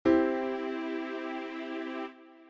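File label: C Major Triad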